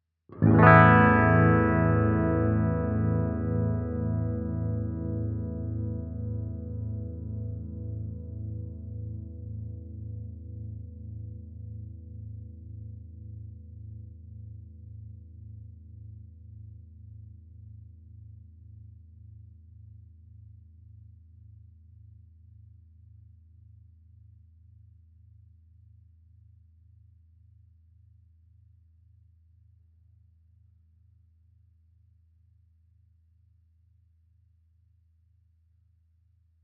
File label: sustained chord no processing